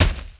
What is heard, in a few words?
hay hit, Impact